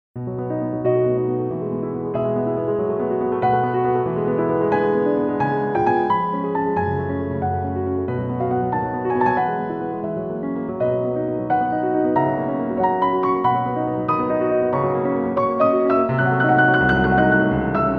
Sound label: Piano No Renshuu